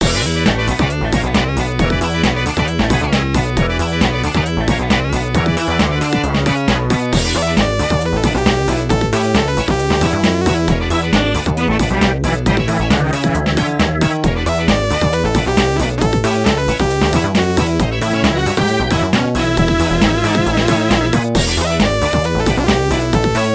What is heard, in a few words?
Background music; MKDS; Waluigi Pinball